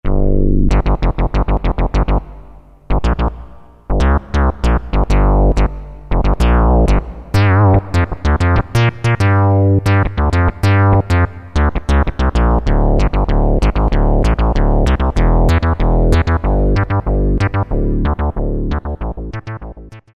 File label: SynthBass, Roland MKS-50; Factory examples